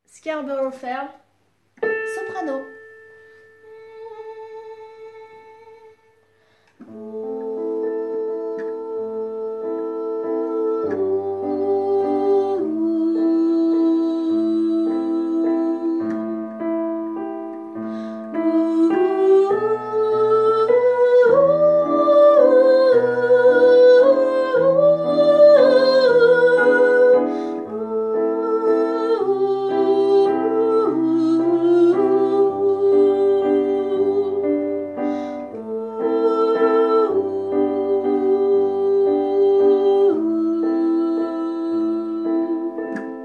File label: soprano